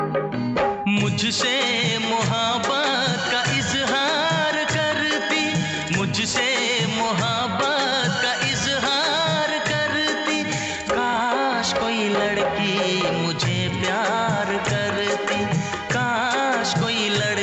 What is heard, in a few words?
Male Version